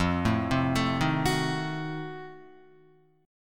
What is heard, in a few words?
F 7th Flat 9th F down Guitar; F7b9 arpeggiated